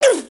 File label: Male Get-Hit; dialog